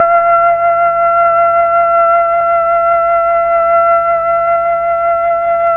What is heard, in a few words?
FLT, Melotron Flt, Slow Flutes; Roland LCDP09 Keys of the 60s and 70s 1